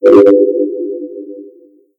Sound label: Futuristic City Terminal